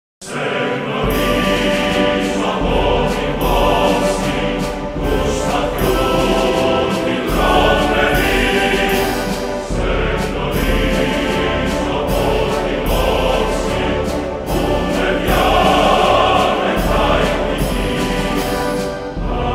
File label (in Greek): Ο Εθνικός Ύμνος της Ελλάδος The Hellenic National Anthem